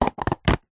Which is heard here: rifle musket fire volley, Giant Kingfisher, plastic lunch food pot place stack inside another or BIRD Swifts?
plastic lunch food pot place stack inside another